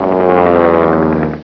Planes; Mosaic Magic

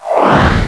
demorph; Odyssey Demo

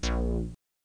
Sound klunk

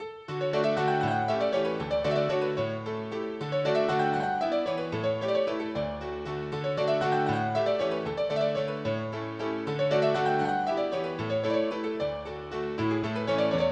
mazurka; 3800 MIDI Magic

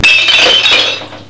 glascon

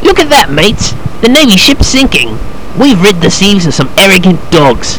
Enemy Ship Sinking

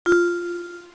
DING; Know Your Birds of Prey - Vultures to Falcons

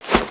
place bag; The Sum of All Fears

